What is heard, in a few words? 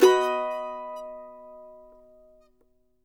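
MANDO MAJ ST, mandolin B major; Sampleheads; Whole Lotta Country 1 & 2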